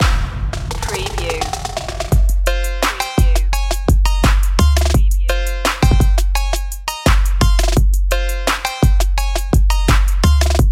hip trap

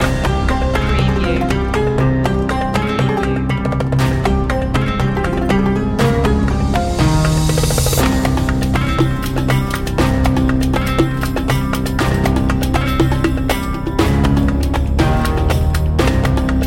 eastern mystic

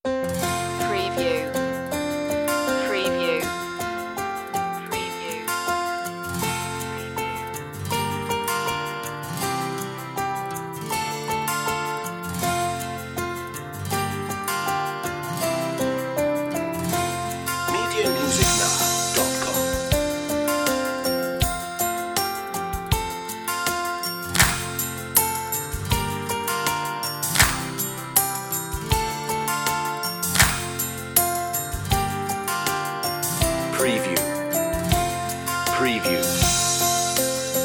bingo instr

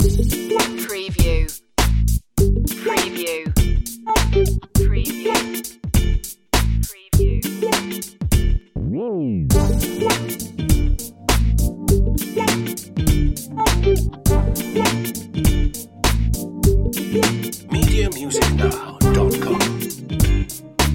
lip service

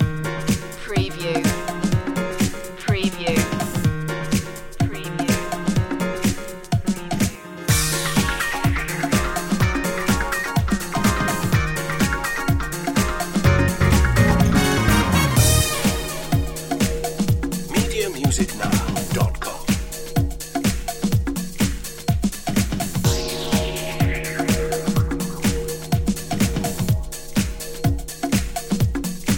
chic loop

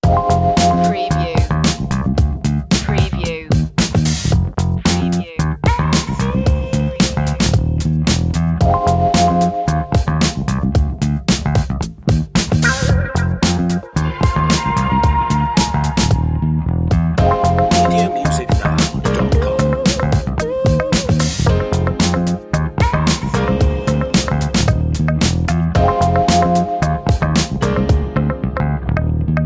jazzomatic underscore

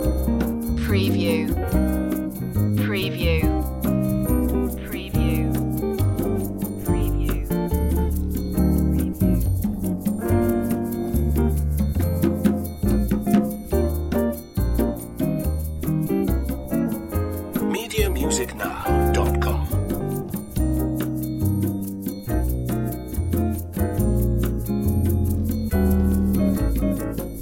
good morning bossa loop

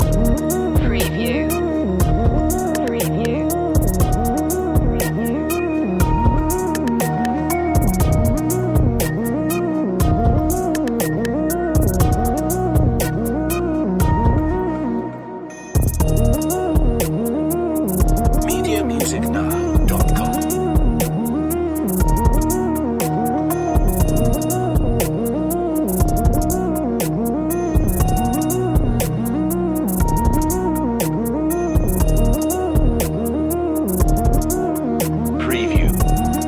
who am i loop long no vocals

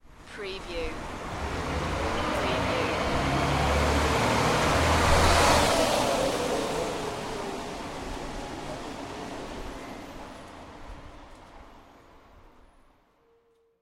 Bus Pass By Europe; sound-effects-bible

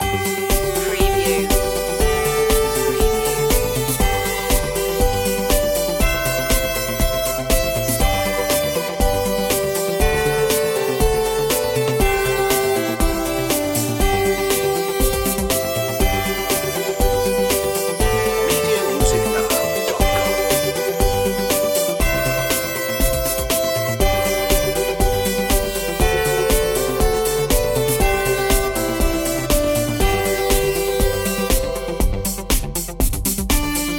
auld lang syne synthified

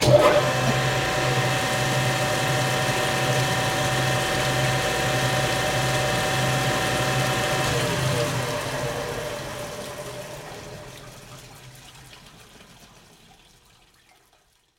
Grinder

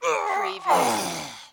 Human Death, Male Dying Sigh, Grunt

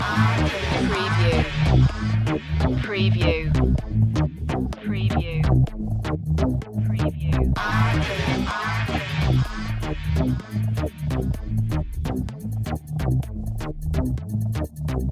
urgent nature loop

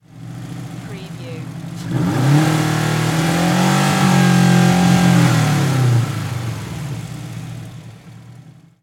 SUBARU STI ENGINE LONG REV

